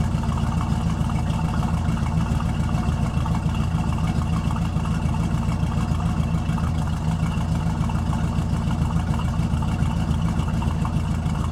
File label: 68 Road Runner Idle Loop; Automobiles